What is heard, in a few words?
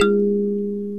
PERC KALIMBA